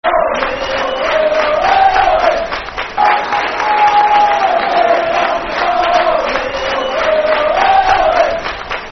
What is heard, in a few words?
Ole Ole Ole, Football Chant, Soccer Song; Livingston Lfc